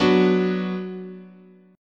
F sharp Suspended 4th Sharp 5th, guitar chord

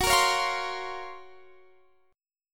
Gb Major Sharp 11th Gb Guitar Standard; strummed; capo 2; x,21,20,18,18,20

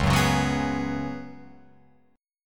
Db 7th Suspended 4th, Guitar, Open F, chord; JGuitar

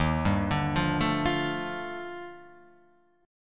Eb Major Sharp 11th Eb Guitar Open F; EbM#11; arpeggiated; 3,2,2,0,0,0; JGuitar

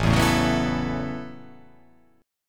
A 6th Add 9th A down, chord; A6add9; strummed

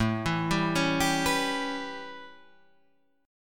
A 9th, down

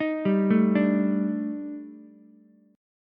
Eb Major 7th Flat 5th, Ukulele; EbM7b5; arpeggiated; JGuitar

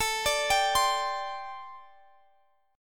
C Suspended 2nd, A, guitar chord; arpeggiated

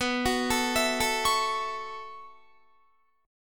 B 9th Suspended 4th B down Guitar; arpeggiated

